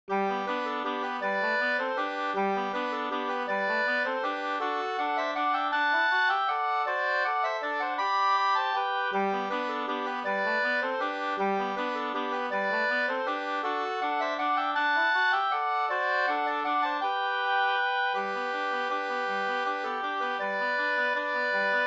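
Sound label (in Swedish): vals Brudvals, two-part; i trestämmigt arrangemang av Lennart Bergström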